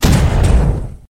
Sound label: Tank missile bomb